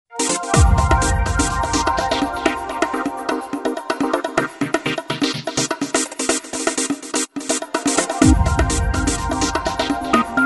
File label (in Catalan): radio jingle sintonia soft house; Ripollet Comunicacio